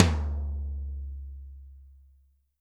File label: Toms Real